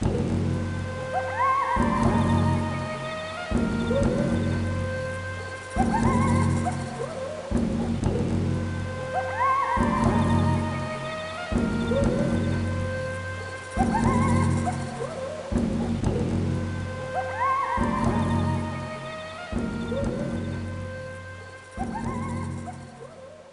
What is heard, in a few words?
Coyote (Loop); pandora's box